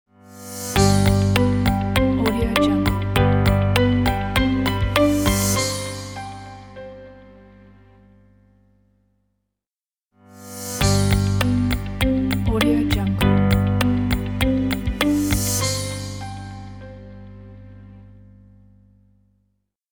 light and warm corporate logo

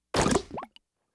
comic weapon blast liquid burst squirt drip

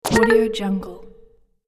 tile stutter wrong

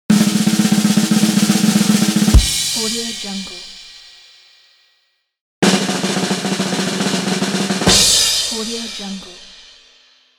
expectation drum roll

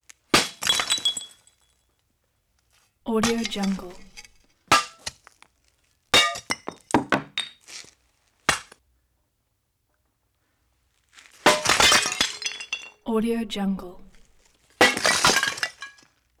pottery breaks; prosoundfx

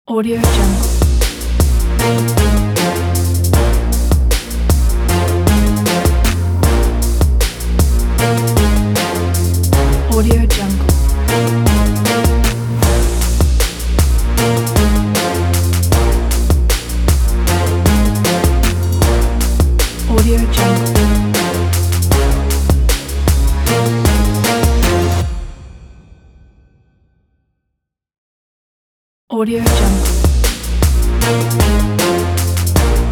trap logo

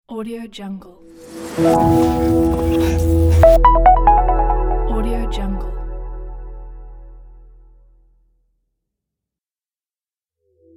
minimalism logo

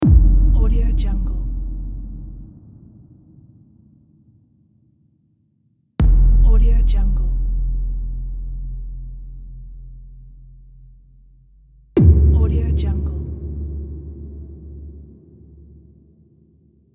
cinematic sub impacts

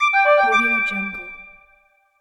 interface effect

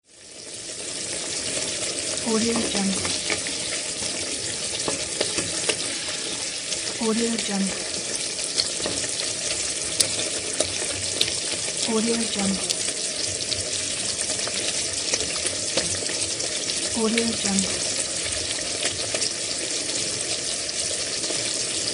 cooking frying pan

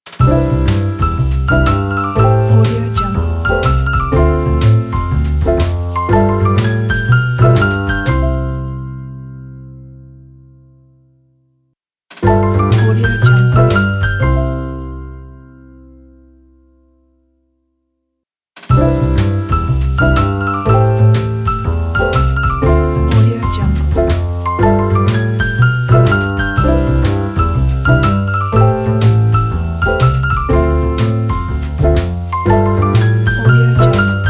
traditional jazz logo; fancywaves

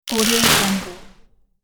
robot chaos impact strike; sonic-boom

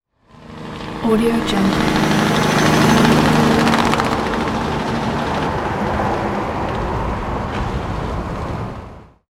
large truck driving with straps

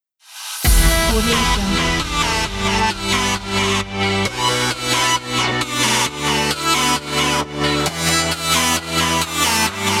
dubstep inspiration

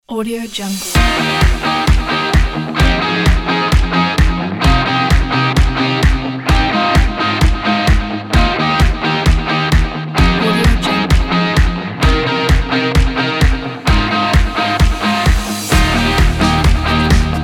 light rock and uplifting inspiring

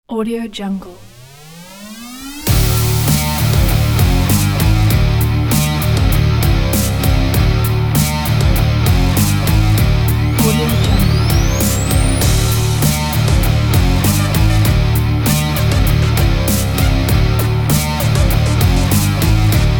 rock step

